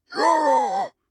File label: Sentry Startle; Hollow Knight; Ruins Sentry Startle